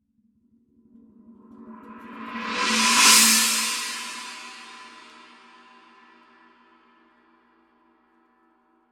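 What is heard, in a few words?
signature precision 18 china roll, cymbales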